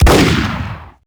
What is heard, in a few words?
shoot desert eagle; weapons; Max Payne